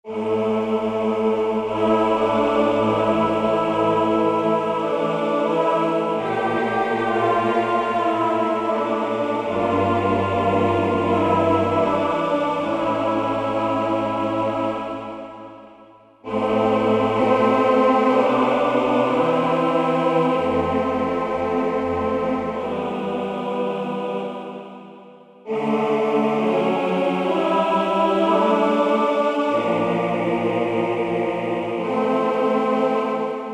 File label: WIDE CHOIR, Roland SC-880; Factory Patches